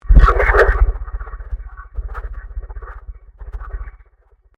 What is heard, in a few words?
Convolution Surge